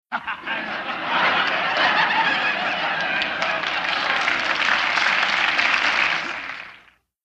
Crowd Applause Laugh; medium

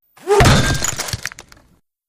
Bullet Impacts Hitti, stone

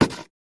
Cardboard Puncture; paper mvmt

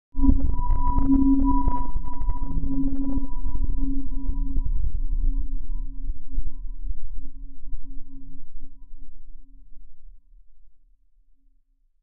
Bed Fluctuating Digi

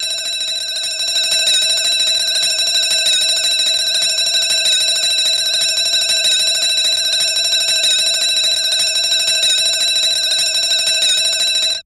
Alarm clock with crazy bell

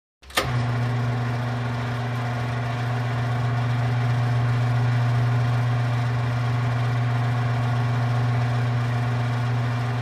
Tire Changer Sequenc, change tire

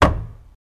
Blade Knife Wood Thu; knives

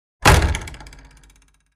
Door Hit With Rattle

